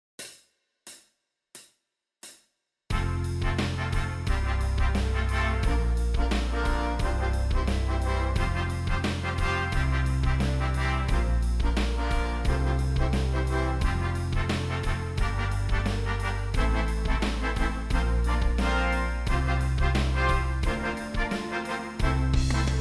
Intermediate Daily Warm up D Horns in Fifths

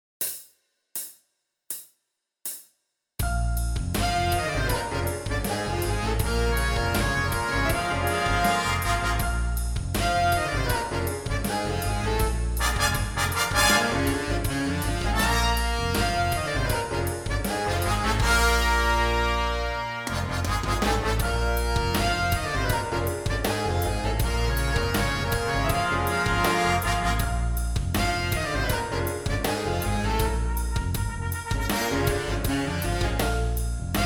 First Lip Slur

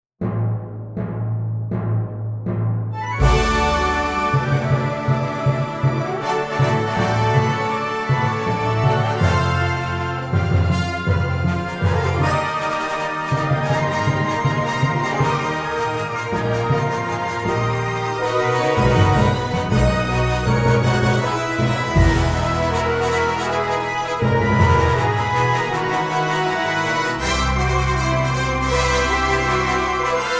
New Note Concert B

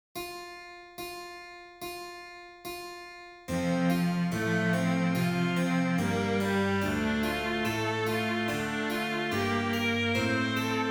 Horns in Fifths

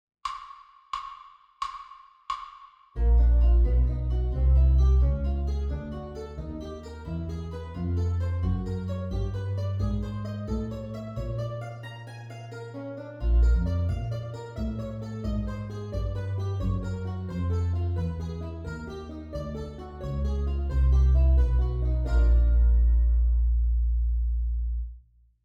Chromatic Scale

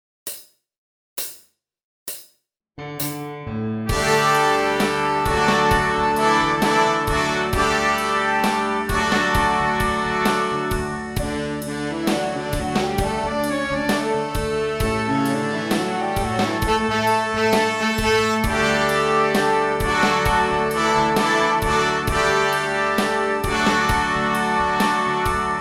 Exercise

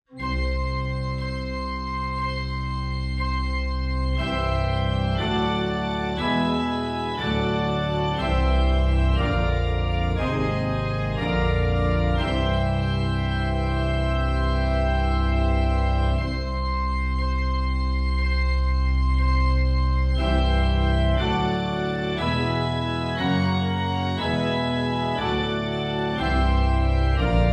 Advanced Daily Warm up B Horns in Fifths

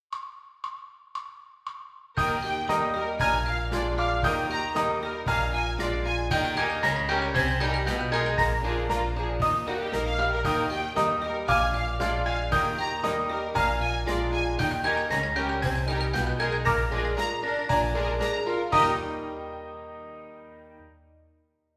Shepherds Hey

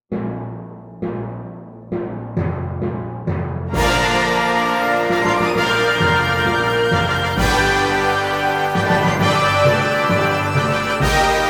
New Note Concert Bb

